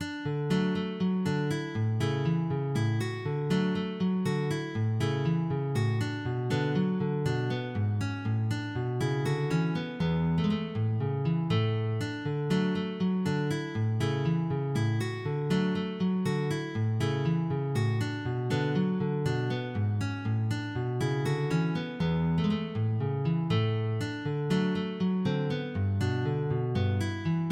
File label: Sarabanda